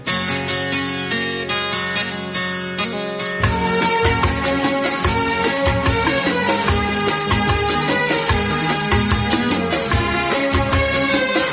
Old Hindi Songs, ringtone

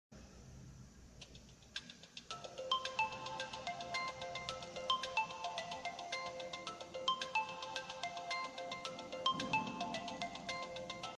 Remix

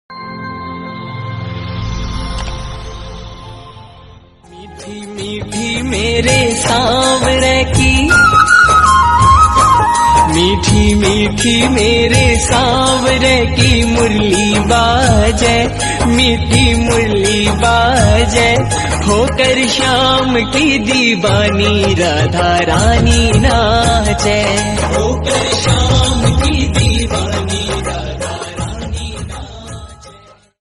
Bhajan; Shyam

